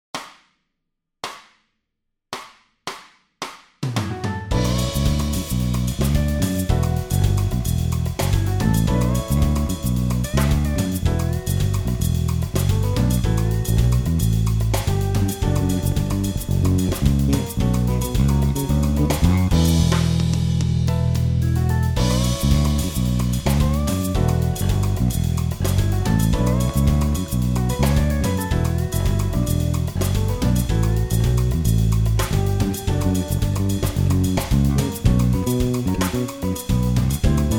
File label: Latin Fast Base Basso